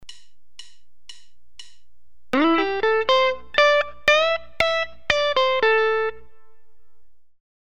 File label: Typical Box II lick, guitar